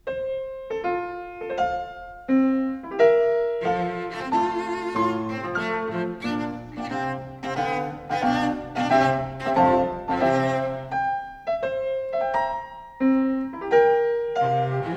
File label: in F Major, Cello; 12 Variations on Ein Mädchen oder Weibchen from Mozart's Die Zauberflöte